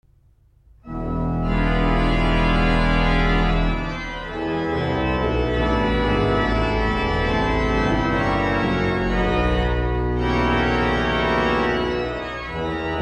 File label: Abbey Organ; Wymondham Abbey Organ